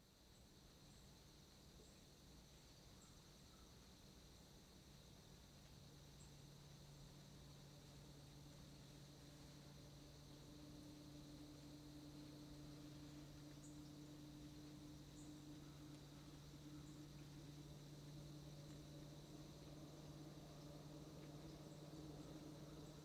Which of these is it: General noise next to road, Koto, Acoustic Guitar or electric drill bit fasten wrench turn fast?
General noise next to road